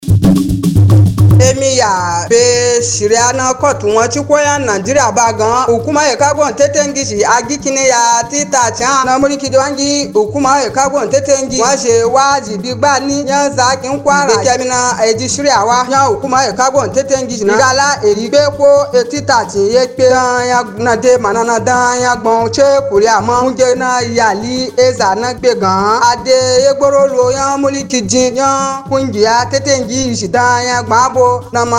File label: Election Jingle NUPE